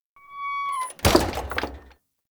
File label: Tailor Shop Door Close; Warframe; Ambience; Nineteen Ninety Nine